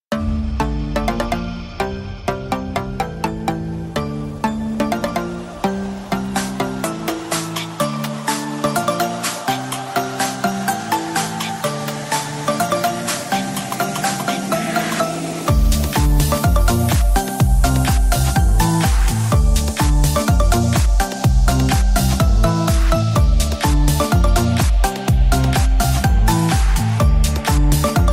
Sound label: ELECTRO VIBES